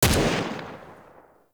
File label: Shot, Weapons; Warframe; Braton